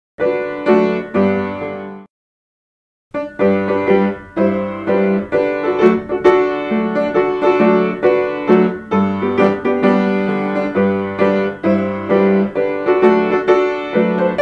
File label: Wise Man Fast, piano; The Wise Man built his house upon the rock (fast)